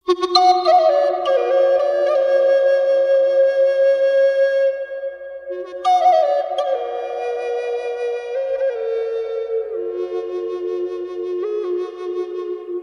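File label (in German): Fis mit Reverb, drone flute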